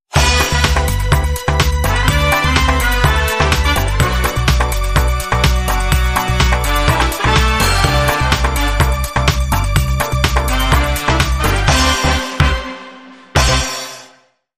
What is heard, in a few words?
Brass On The Groove; 15sec